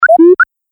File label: Pickup App Game; Video Game Sound Effects